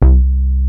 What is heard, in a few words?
Sub Sonic Bass C2